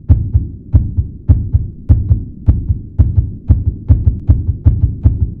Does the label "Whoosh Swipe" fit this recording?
No